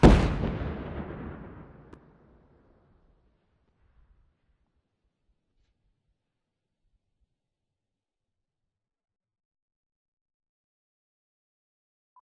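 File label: Stalker Nuke Destruct Explosion Distant; Titanfall 2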